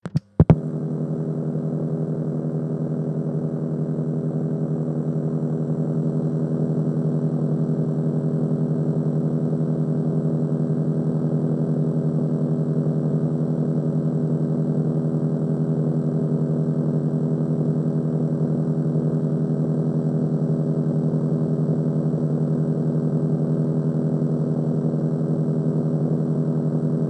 Cassette Player Switch On Fat Hum Through Tiny Speaker